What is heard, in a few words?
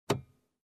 Car compartment click